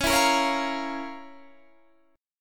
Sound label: F Major 7th Suspended 4th Sharp 5th C sharp